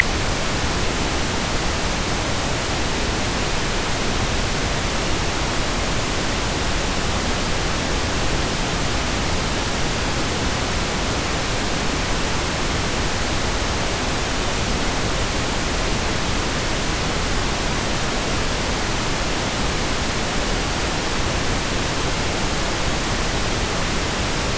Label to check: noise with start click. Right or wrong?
Right